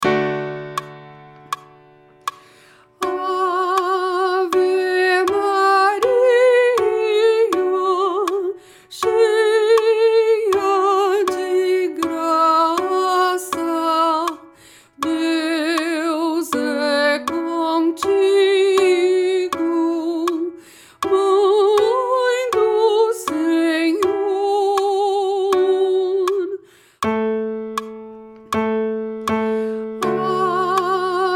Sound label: soprano